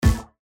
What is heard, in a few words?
Burst Musical